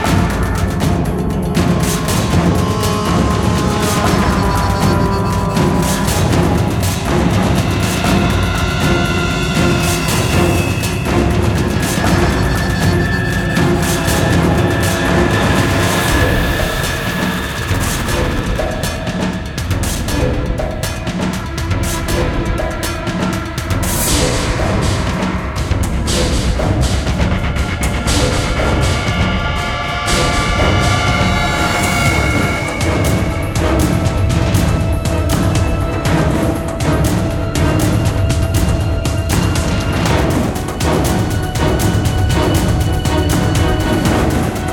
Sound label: Combat Suspense; Singularity